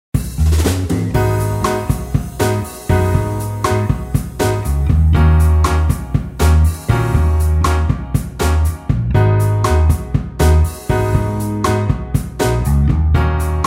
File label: Jazz